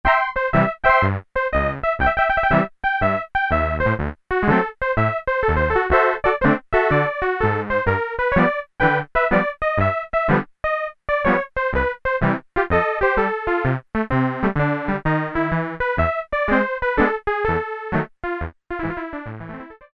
Honky Keys, Korg Poly-800

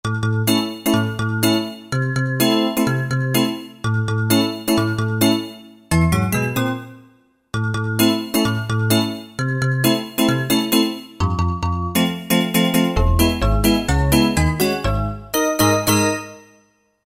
Agogo Rhodes, Roland JD-990; Factory Patches

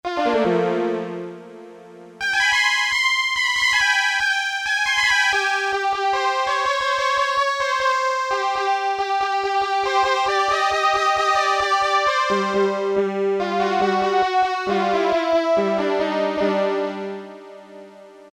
SITAR, Casio Rapman; Tones

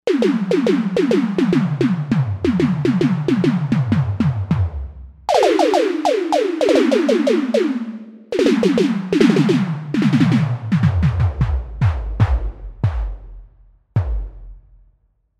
SynTom, Novation UltraNova; Factory Patches

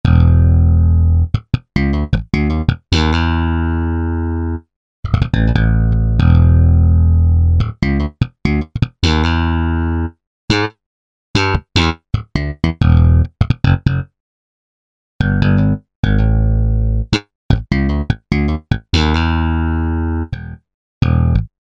Slapped Electric Bass; Apple GarageBand 2

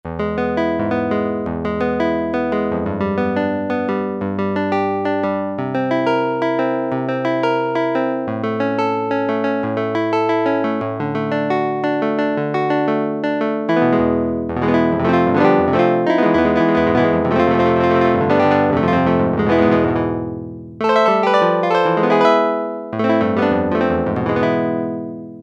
Guitar, Roland Juno-60; Factory presets

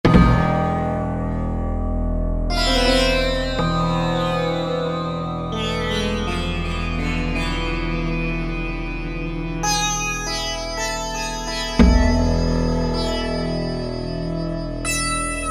World Sitar, Alesis QS8; Factory Preset examples

